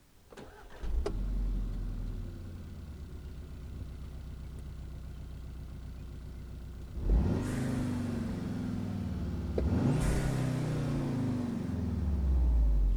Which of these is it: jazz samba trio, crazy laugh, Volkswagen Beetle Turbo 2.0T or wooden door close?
Volkswagen Beetle Turbo 2.0T